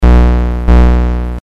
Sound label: Boiing Soft